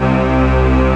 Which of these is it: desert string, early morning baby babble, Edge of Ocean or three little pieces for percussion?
desert string